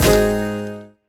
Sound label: stop scatter on reels; Wild Guns